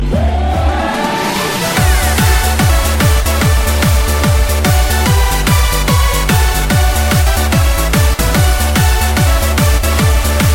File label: Horn; Isengard